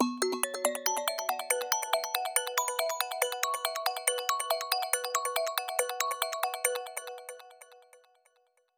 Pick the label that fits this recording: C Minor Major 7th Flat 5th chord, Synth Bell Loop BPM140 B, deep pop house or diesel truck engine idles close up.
Synth Bell Loop BPM140 B